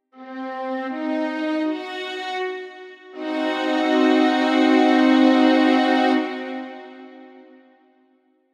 chord st mb5

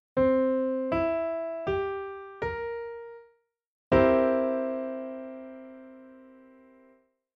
chord pf dom7

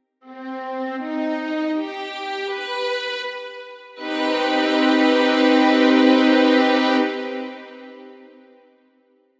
chord st mmaj7

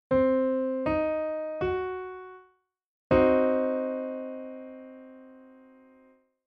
chord pf mb5

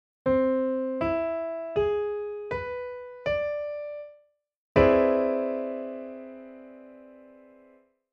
chord pf augmaj79

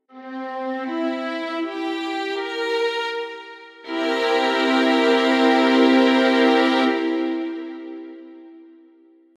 chord st dom7

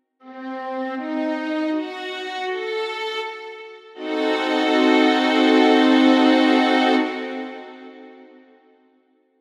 chord st dim7

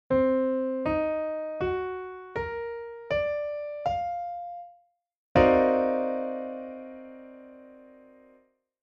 chord pf m7b511

